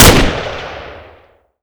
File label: mp40 fire; weapons